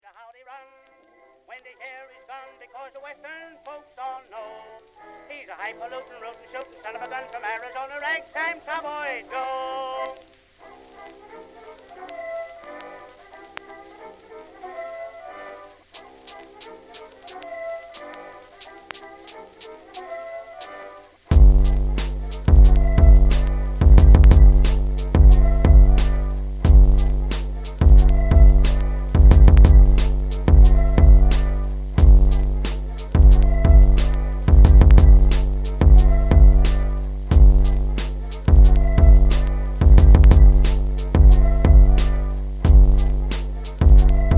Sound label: instrumental